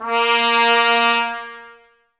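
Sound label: brass trumpets A#; 90 s Sample CDs; Miroslav Vitous; Woodwind Brass Ensemble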